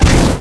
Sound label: ABYSS FLAME hit